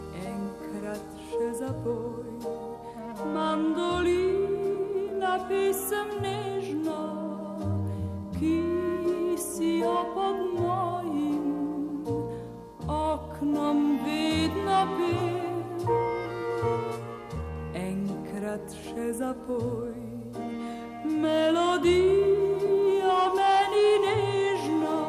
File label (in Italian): mandolina